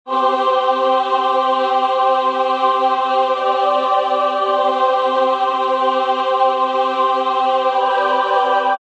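Loop Orchestral Choir C 110, Choir loop C Major 110 bpm.; Choir Loops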